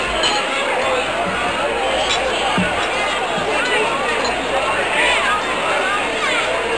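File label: cafeteria; ENVIRON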